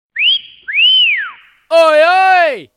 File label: Wolf Whistle